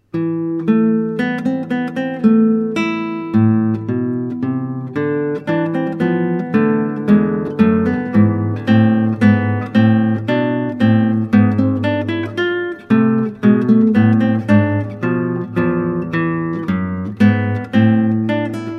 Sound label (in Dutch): Klassieke Gitaar; Bach 50 Solos